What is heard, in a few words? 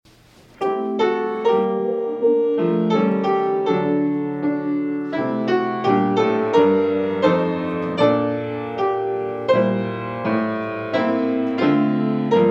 Hymn; Like the Murmur of the Dove's Song